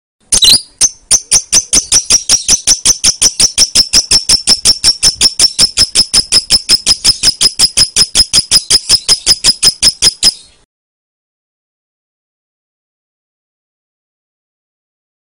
audio lovebird; putri mentaya

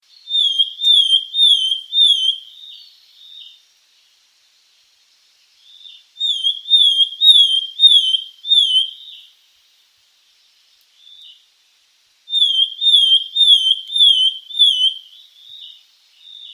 gelatik sultan song

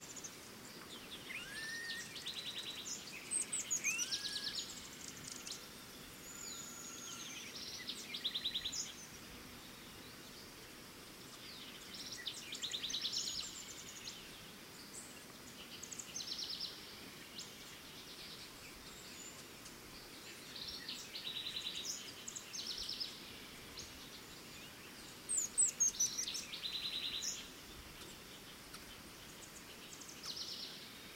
suara alam

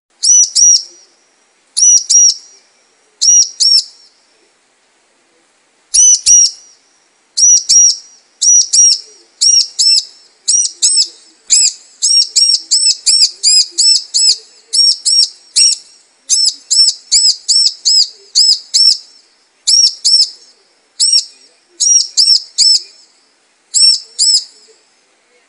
terapi ciblek macet

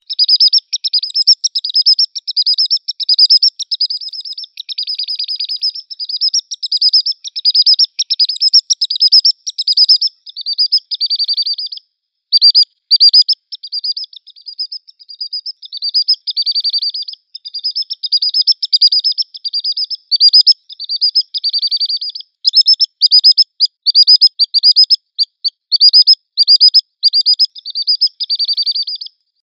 trinil pantai

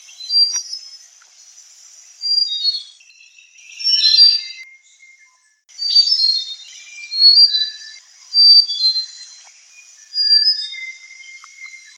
sikatan sunda

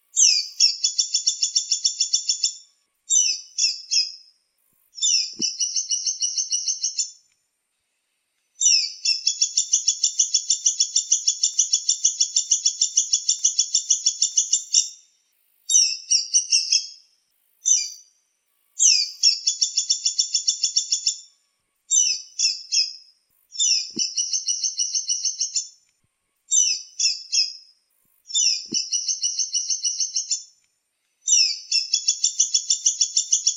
suara caladit

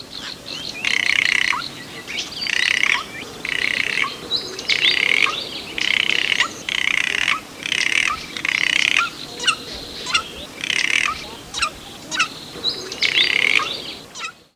tangker cetrong variasi

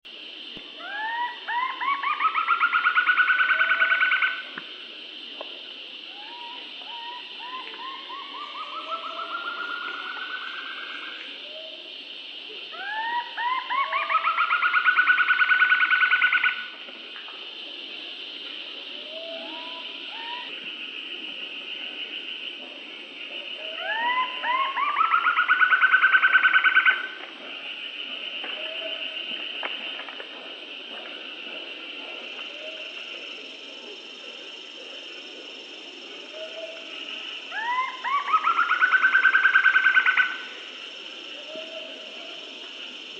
suara cekakak pita numfor

